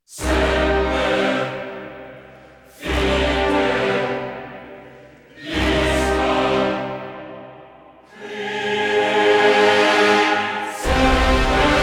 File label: Orchestra And Choir Only, Epic Choral Action; трейлерная музыка